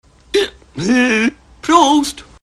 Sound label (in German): schluck; cstrike